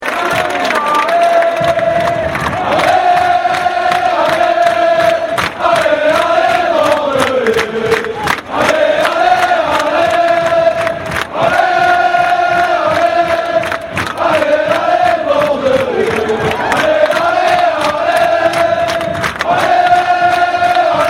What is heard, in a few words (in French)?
Allez Allez, Chants